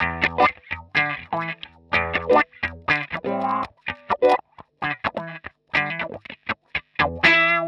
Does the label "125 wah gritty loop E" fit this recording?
Yes